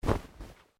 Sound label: Clothing Shirt Cotton Move